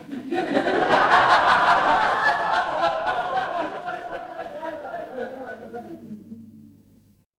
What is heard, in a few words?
crowd laughter